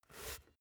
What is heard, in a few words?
basketball turn swipe short quick